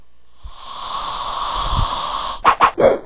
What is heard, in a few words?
alien